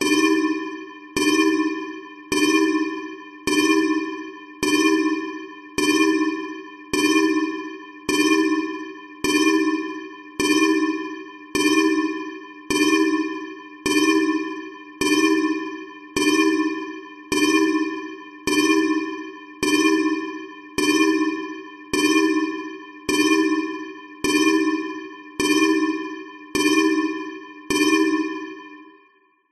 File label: alarm big warning meltdown beep large reverb fast; science fiction